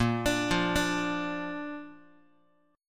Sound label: Bb Major Bb down Guitar; arpeggiated; JGuitar